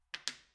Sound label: domino